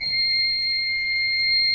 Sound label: C6, Cathedral Organ; ILIO; Propeller Islands Cathedral Organ